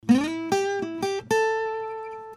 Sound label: Guitar Bites